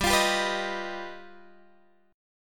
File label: G Augmented 7th, Guitar Open G